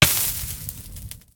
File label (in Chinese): 火焰受伤声; 玩家; 玩家火焰受伤声